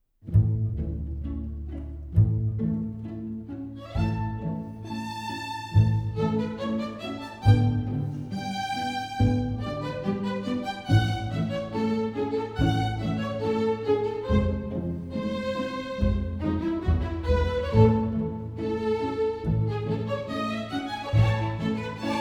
Variations on a Slovakian Folk Song: Variation V - Allegretto, Quasi Serenade; Orchestral